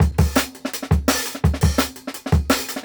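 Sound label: Jungle Breaks, 168 bpm; Original Jungle Breaks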